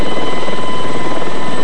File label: heli